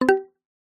multimedia alert generic mallet wood

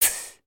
musical human beatbox open hi hat

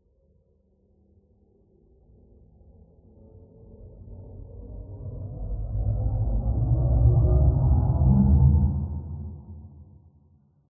Transition Liftoff; PMSFX

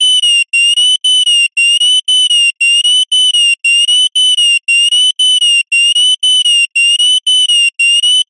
science fiction console alarm beeps; zapsplat

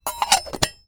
silverware pot lid movement put on close; household

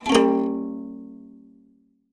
ukulele untuned discordant strum; musical; zapsplat

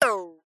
cartoon pluck twank spring

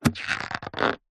balloon skin stretch; foley

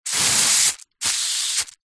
furniture polish can spray; household

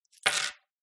Coins Set Down On Wood; PMSFX

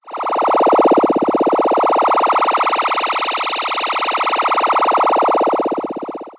science fiction tractor beam

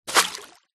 fishing net splash hit water fast

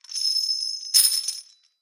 Chains pitched Chains Drops; Just Chains; soundbits

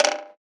Selection - Deny Selection; sound ex machina

